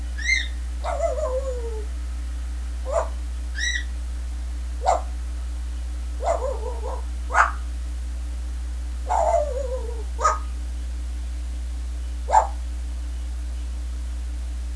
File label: dog bark